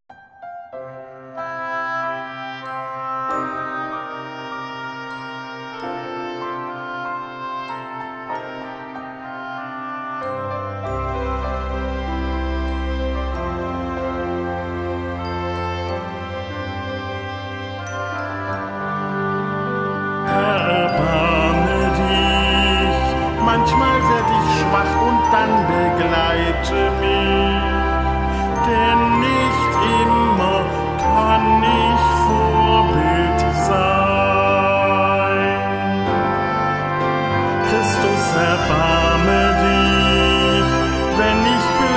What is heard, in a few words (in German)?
Kyrie Gesang